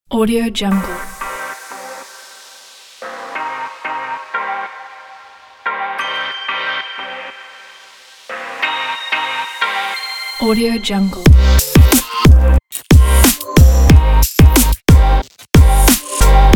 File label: abstract future beat